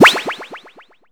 SE COLLECTION ALLGET APPEAR; maimai

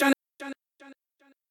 voice

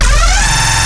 bass purge

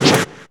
SHOJI CLOSE; maimai

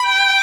violin

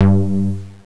bass; Angelic Layer

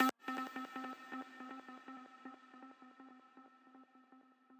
arp

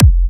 Kick; WAProd Free Kick Samples 2018; WA Kick Pack 7

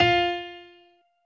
piano

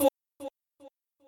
voice

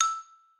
xylo

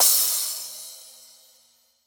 Dr Crash

